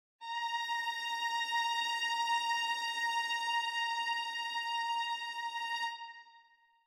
Tension

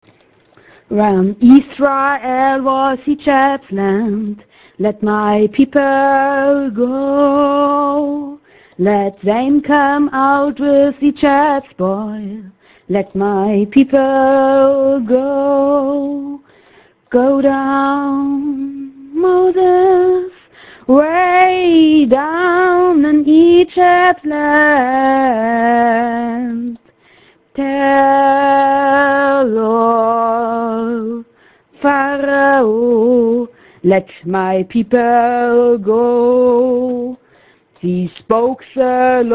Go down Moses

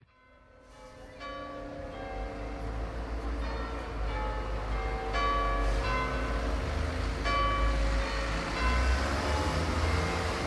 Carfax Tower Chimes

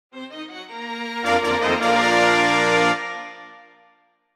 Trumpet call heavy

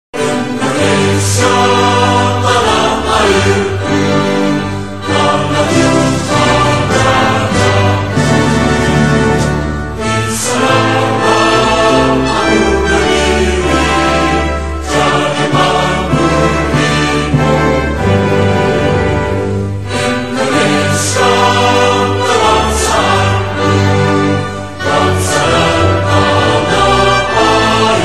Indonesia Raya Versi Choir

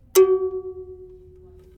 Kolitong sound

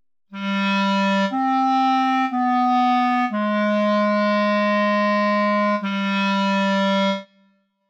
sound of tired

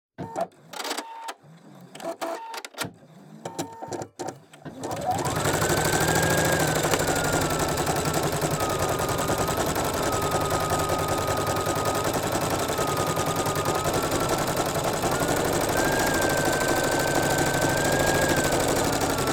Sewing Machine Auto Sewing Full Close; InspectorJ

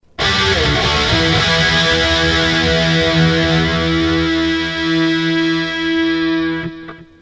Powerchord with dist

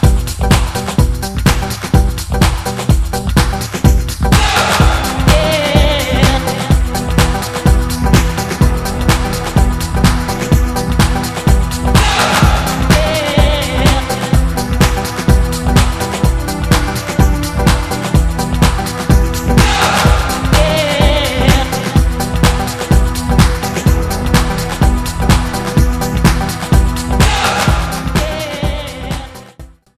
Techno Samples

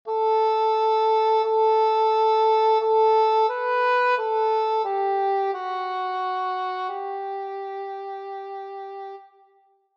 Baby Bear Sad

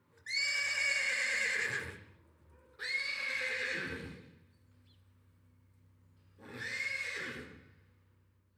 HORSES - Whinny distant; G4F SFX

